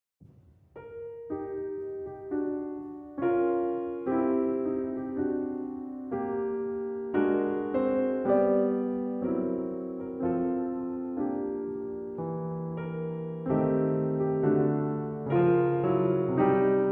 Ballade in G Minor (Variations on a Norwegian Melody)